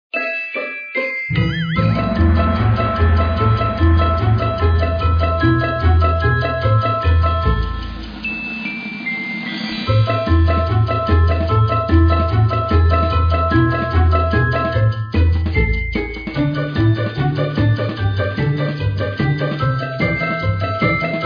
Menu Music

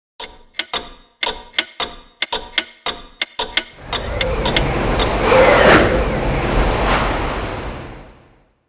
Clock Tower Sfx; Disney Princess: Enchanted Journey; Wii

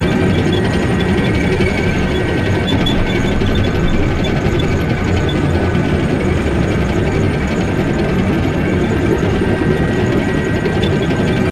tank drive

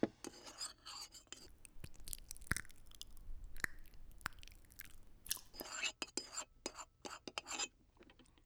Eat Food

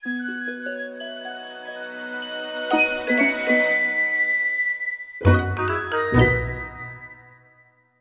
Minigame Chicks Win

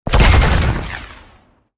Blazer Shoot; Tank Stars; android

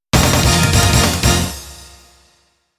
Entering Jingle; power instinct matrimelee original soundtrack

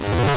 bronto Moan; Dino Run

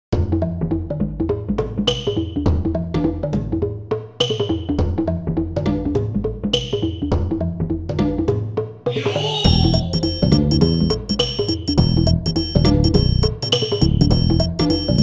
Tropical Trouble; Frogger; PSX; Tropical Trouble 2 (PC Version Only)

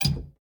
Window latch; the 39 steps

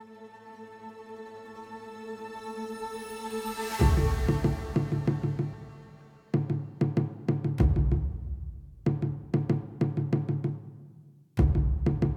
Music Tutorial; Sifu

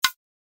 Hit

